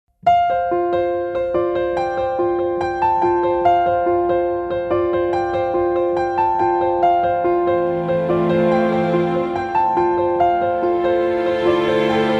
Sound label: Intimate Piano Strings